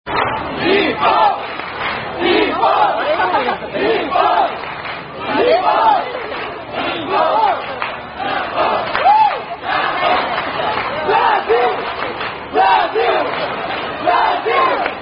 Chant

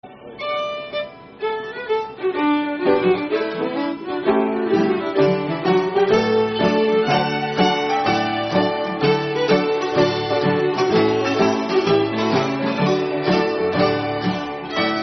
Detroit Schottische

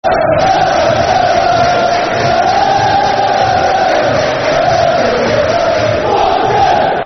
Der Der Der [Football Chant (Soccer Song)]; Swansea City; Swansea City Scfc

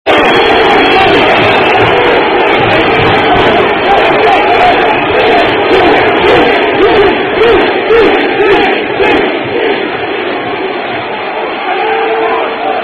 Football Chant; Luton Town Chant - Andy Durso Is A Wanker

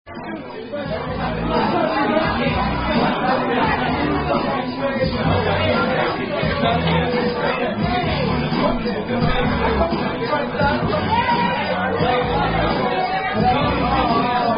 Drinking Game; French Vs American Mexicans Part 2

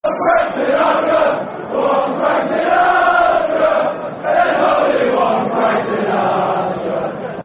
Football Chants, Man City; Man City Mcfc

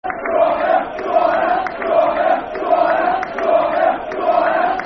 Football Chant (Soccer Song); Brentford

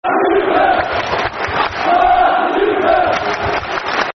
Football Chants (Soccer Songs); Mark Viduka; Middlesbrough Mfc

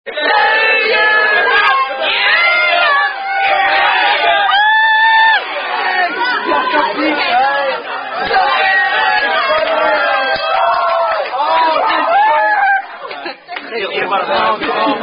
Rugby Chant; Rosi Boy Rugby Chant

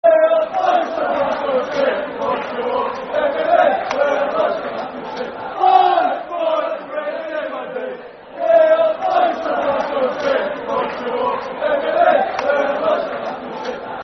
Football Chant (Soccer Song); Black Country; Walsall Wfc

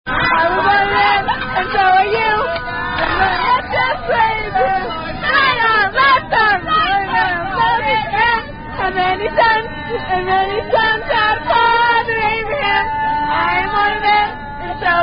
Sung In Car While Driving; Father Abraham

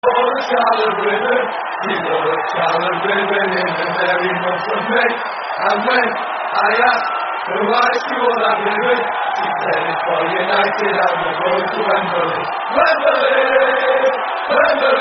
With Crowd; Scarlet Ribbon Wembley; Man United; terrace chants

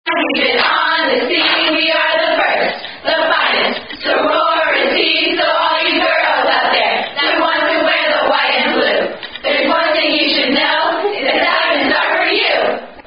Sorority Song; Alpha Delta Pi Sorority Song; Theta Epsilon

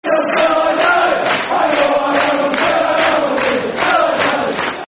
Rangers Till I Die, Football Chants; Rangers GRFC

